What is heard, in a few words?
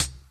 dark pedal hi hat sound c sharp key, Royality free high-hat tuned to the C# note.; one shots; hat samples